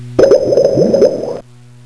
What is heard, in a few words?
bubbles